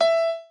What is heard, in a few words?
piano